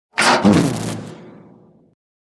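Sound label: Large Switch Fldlight, switches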